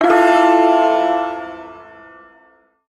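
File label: Shawzin Major Chord; Maj Chord F; Warframe; Duviri Ersatz Shawzin; Chords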